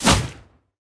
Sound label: Demon Basic attack; Level16